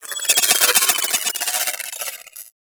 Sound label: Pulse Projectile By; Warframe; Warframes; Gyre